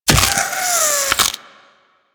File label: Embed Burrow; Uzi; Warframe; Infested